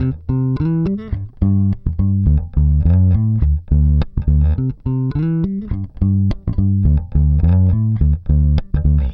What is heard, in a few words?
Pop, Bass